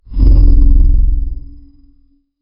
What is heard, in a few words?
deep guttural demonic dea; warrior